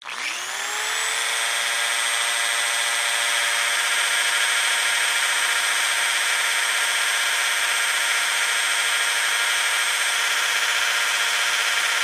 Dremel tool with wire brush attachment grinds at variable speeds.; Tools, Hand Grinding, Tool Motor, Tool